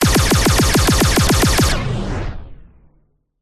science fiction weapon semi automatic gun shoot multiple